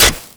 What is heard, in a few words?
pick ice snow